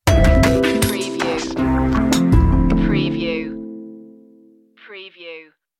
classical, chill

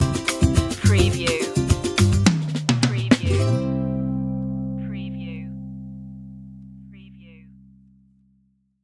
easy life stinger

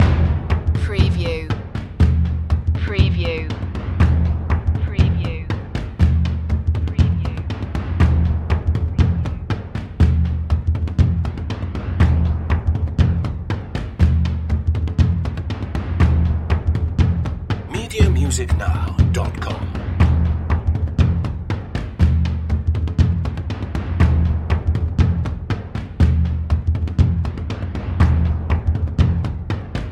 voodoo dance loop

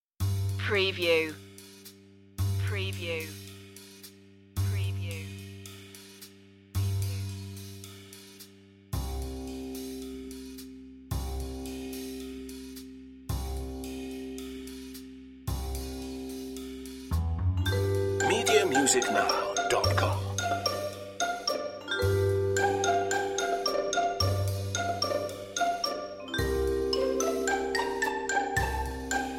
no strings pizz chords

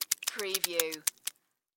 Blood Drips Thick; sound-effects-bible

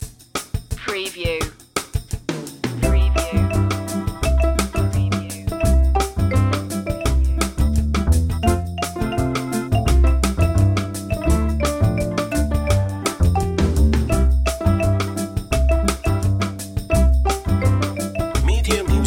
jingle bossa bells

